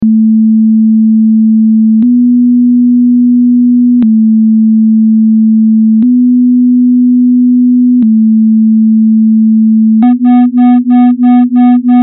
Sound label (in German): Kleiner und großer Ganzton; Klangbeispiel zum Vergleich der Ganztöne